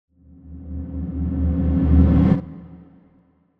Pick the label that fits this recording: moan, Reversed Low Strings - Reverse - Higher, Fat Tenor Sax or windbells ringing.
Reversed Low Strings - Reverse - Higher